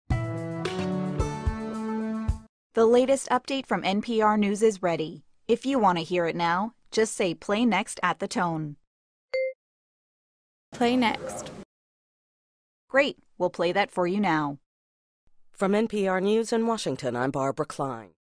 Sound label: music; playnext v2